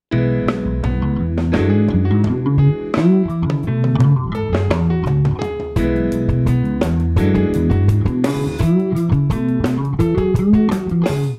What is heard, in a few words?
fretless